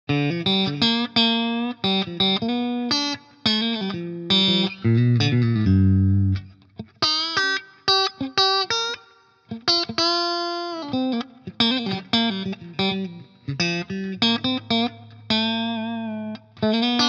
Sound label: Charvel Pro Mod DK24 HH FR clean Mitte